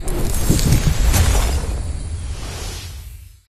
exploding wild; Recode; rampage riches Desktop

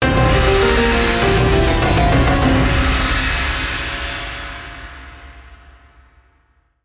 Bonus Outro; Temple of Luxor

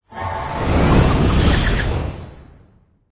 symbol animation freespins; Recode; rampage riches

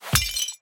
hit; Fantasma; hades River Of Souls

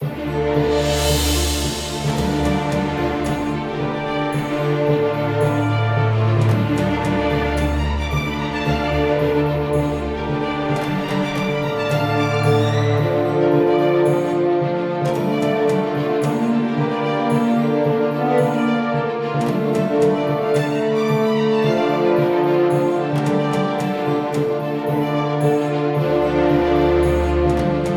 Bonus Loop; Mirror Magic

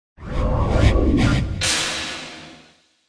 enter negative fast; medallion; Fantasma

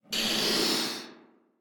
bubble in; astro Legends Lyra and Erion Desktop; Foxium

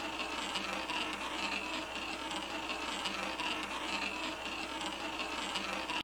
Ball Roll Track, Roulette wheel; Real Dealer European Roulette Classic

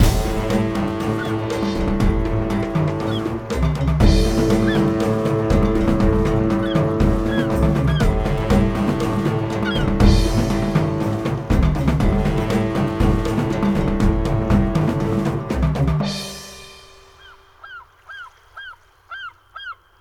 Bonus Loop Outro; genesis; jasons Quest Desktop

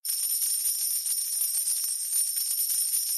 tally up loop; Skillzgaming; battle Mania Desktop

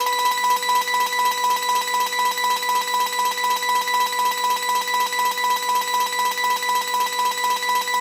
Big Win Tally Loop; Tiki Mania

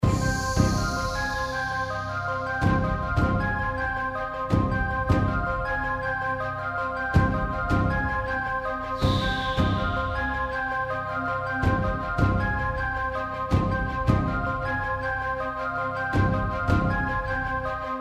bonus music; Skillzgaming; gems odyssey 92 desktop

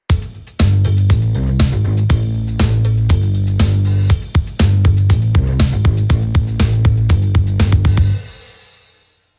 Bonus Music Loop Outro; genesis; euro Golden Cup

